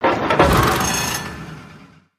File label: reload back